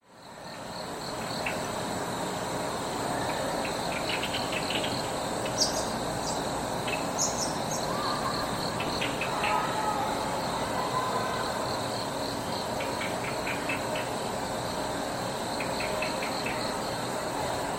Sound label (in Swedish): Svarthalsad trast